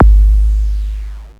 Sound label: BD, Mutable Instruments Anushri